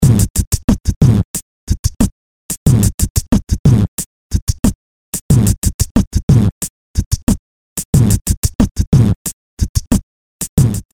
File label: Human Beatbox, Hammerhead; Bram Bos